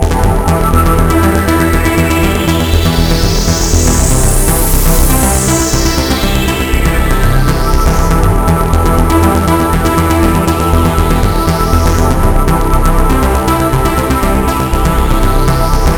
Arp, Keyboards

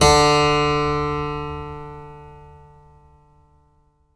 HARPSI D3; HPSCHD 4

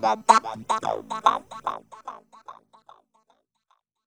BEAT FX, Funky Vocals; USB Soundscan vol.18; AKAI; TB BEAT

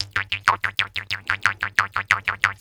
KHOMUS

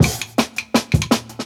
10 Pin Beat; 90s Sample CDs; Original Jungle Breaks

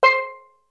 Melody oneshot; ZERO G - Datafile DISC 3

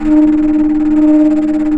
FLT, Bass Flute, Bs Flutter; Roland LCDP04 Orchestral Winds; 90 s Sample CDs

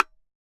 Bongo Fingerslap Macho; Best Service; Festa Latina VOL-1; Single-Hits

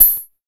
polyvox perc, Polivoks; Formanta Radio Plant

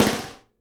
snare GLASS, Live Snares

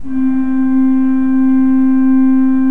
C3, Cathedral Organ; ILIO; Propeller Islands

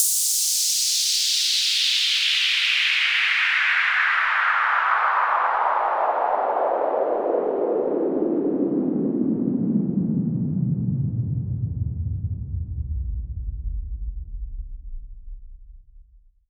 Sweeps Down

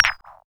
Electrical Marbles; VM1 Electrical Marbles; Cwejman S1; DevSnd Cwejman S1 - JK

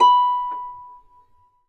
B5 HARP; USB Soundscan vol.62; AKAI

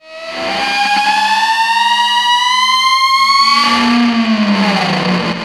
Feedback, Industrial; Nasty

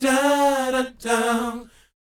BOYZ G, Vocal Planet, R&B Dance; Spectrasonics Vocal Planet

